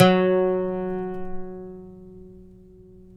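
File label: pluck OUD F#; Roland - LA Composer vol. 3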